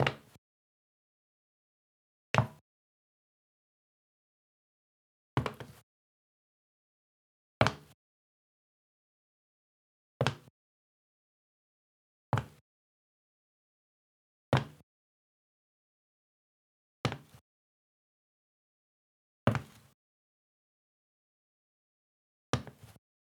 Footsteps On Shower Room Floor Tiles Wearing Brogues